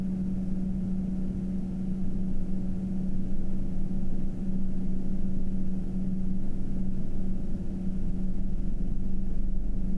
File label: 1000 RPM